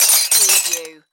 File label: Dishes Break